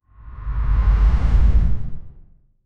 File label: dark trap cinematic whoos